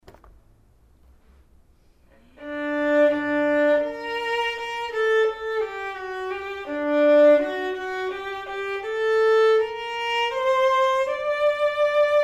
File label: perf vln